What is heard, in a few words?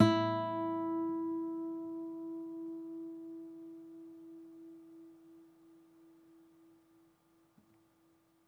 Open, Classical Guitar